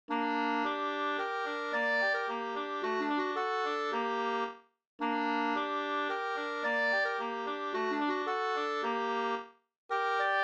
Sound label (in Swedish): slängpolska